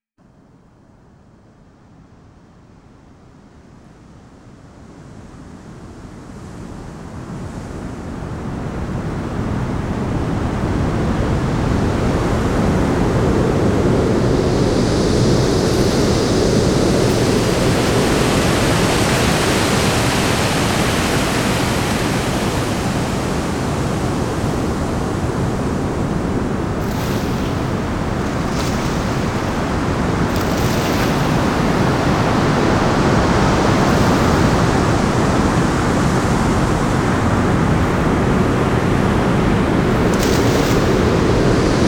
Nature Sounds, Sounds from the Seas and the Oceans Sounds from the Sea Breaking W; Sounds from the Sea 3 Breaking Waves; Nature Chill Out 2014